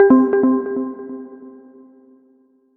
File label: Alert